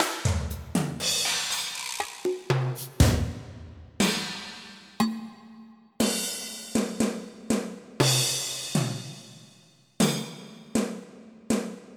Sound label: Power; Onj's Soundfont